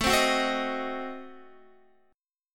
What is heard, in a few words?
A Diminished 7th A down Guitar; Adim7 strummed; JGuitar